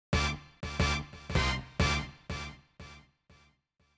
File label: brass